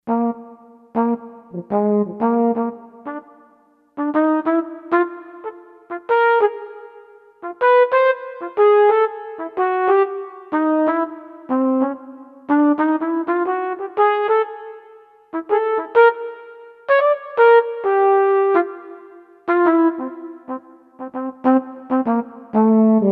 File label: FlugelHorn, Korg MSC-4S Orchestra; Orchestra 1